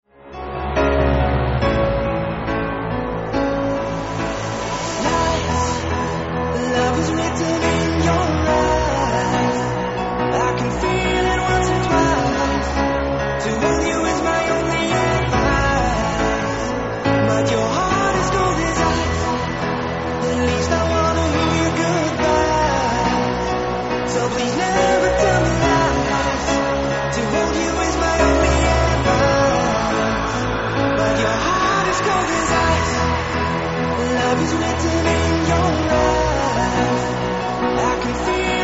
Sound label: Piano Mix